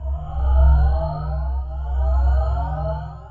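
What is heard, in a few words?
Ambience Sci-fi Energy Loop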